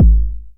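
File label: 909 BD tape, kick drum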